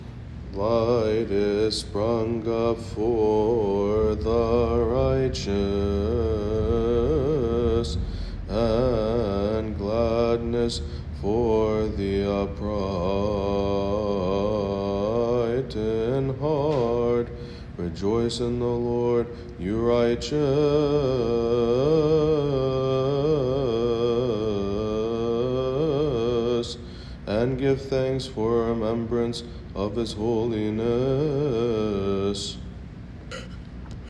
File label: Psalm Chanting Annual; Liturgy